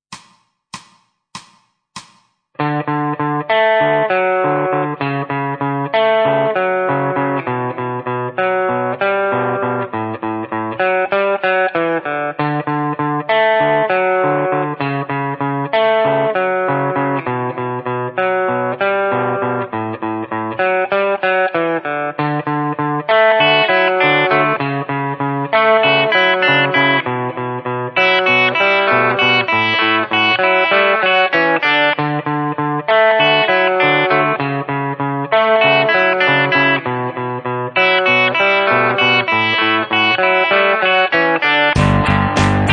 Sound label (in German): E-Gitarre